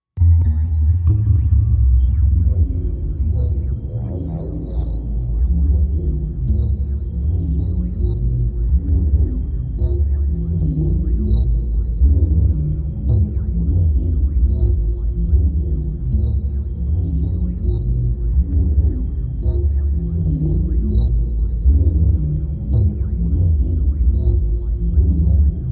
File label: Going Somewhere Low Slow Rhythmic Bell Tones; underwater